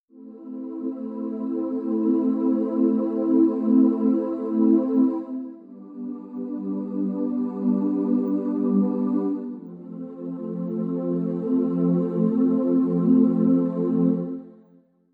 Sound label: alien after choir